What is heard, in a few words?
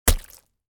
Cartoon splat